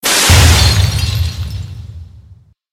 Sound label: explosive decompression; Code7